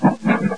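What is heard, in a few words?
scuttle; duke nukem total meltdown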